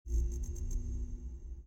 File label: menu opening